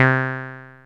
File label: ACID C3, Alpha Dance; Best Service Alpha Dance vol.2